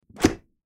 Dishwasher Door, Dishwasher Door Close; General Electric Ge Appliance; Household; Appliances; Blastwave

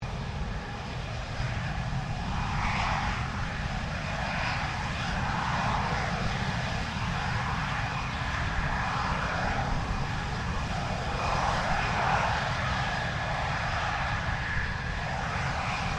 F16 Falcon idle; Airplanes; Vehicles

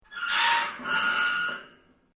Metal plates scrape; Material components to create more complex sounds; Materials

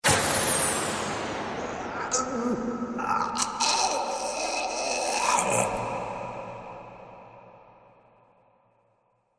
Magic Monster Metamorphosis, monster sound effect; Monster Sound Effects

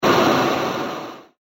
Short And Grainy Impact; Miscellaneous Impact; Andrew Fleming

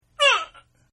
Grunt; Grunts; Voice; Partners In Rhyme

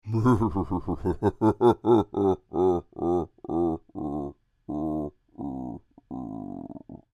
Creature, Human, Devilish Laugh; Horror

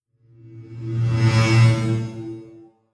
Whoosh SciFi Saber Slow Motion; Whoosh Saber Slash Slow Motion Scifi Science Fiction; Light Sabers

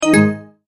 multimedia tone end; McKinney Sound

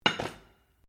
METAL ON GRAVEL IMPACT GENTLE; Metal (Sound Effects:Materials)

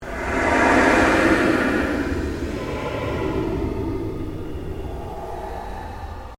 PULSE LONG SWEEP

